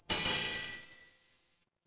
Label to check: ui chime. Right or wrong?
Right